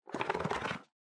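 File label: Sensu Close, folding fan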